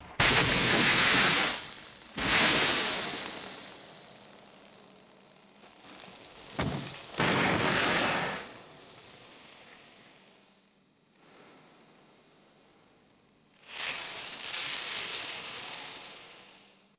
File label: dry sand and dust falling and scattering